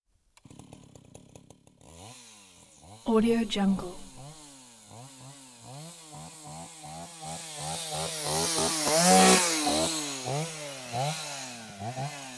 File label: chainsaw revs